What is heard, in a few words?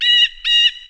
Windy Forest